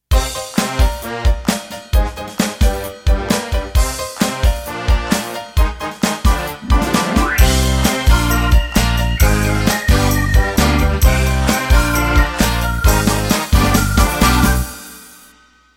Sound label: Chalypso Intro